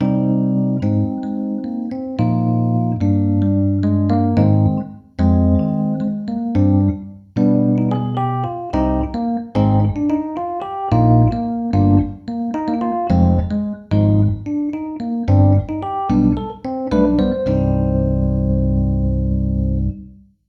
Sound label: vintage key